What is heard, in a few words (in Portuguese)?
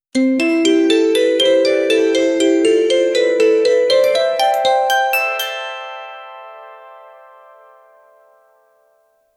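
arpejo mágico de harpa; high volume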